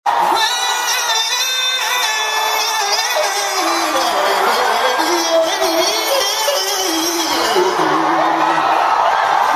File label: hits amazing note